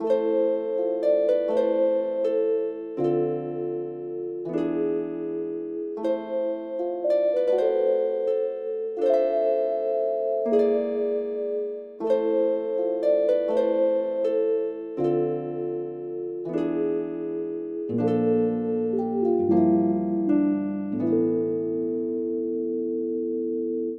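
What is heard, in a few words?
background music cutscene conversation dark; starfruit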